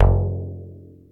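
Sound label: FRAME DRUM, ETHNIC PERCUSSION; AKAI S6000 CD-ROM - Volume 5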